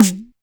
Perc, Alesis DM5

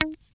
GUI D#4, E-GUI MUTED; Sound & Vision; Gigapack I CD 2

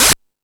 Discoball, drum machine; E-MU Pro-Cussion-1

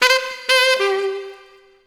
Sax Funky; Drum N Bass; Misc; Sonic Foundry (Sony Creative Software); Knutrix Drum & Bass

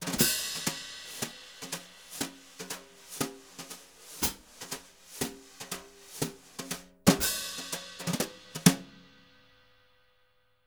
BRU, BRUSH; Univers Sons; Jazzistic

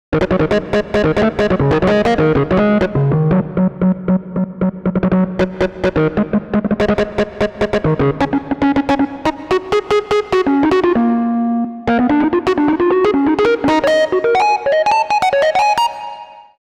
Rhodes Solo, Cinematic Funk; Sony Creative Software; Cinematic Funk Urban Tone Poems

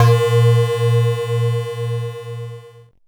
BELL-GONG, A#; Big Fish Audio

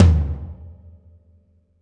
R B Floor Tom B Close; Toms; Sonic Foundry (Sony Creative Software) - Drums from the Big Room The Mixes; R&B One Shots

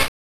Hat, Genesis; ToeJam & Earl 2

